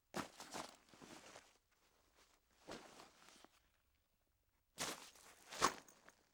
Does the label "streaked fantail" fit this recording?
No